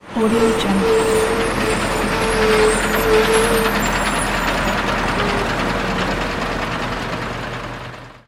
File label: braking screech; crisstyna